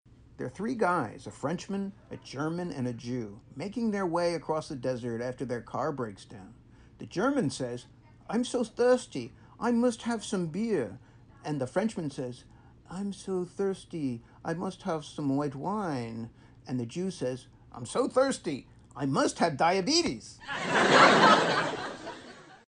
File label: Laugh Track; Calvin Trillin; Joke